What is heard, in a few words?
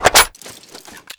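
weapon foley dragunov reload clip out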